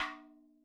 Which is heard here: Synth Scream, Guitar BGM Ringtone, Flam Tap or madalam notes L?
madalam notes L